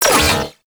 Bonus Objective Fail; Warframe; Nokko Colony

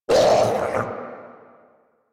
Pain; Grineer Ghoul Devourer

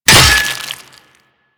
Axe Impact Flesh; Warframe; Weapons

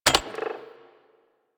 Arch Gun Alt Mech; Warframe; Archwing; Hildryn

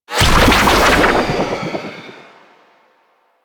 Absorb Activate; Stalker Assassin; Warframe; Warframes

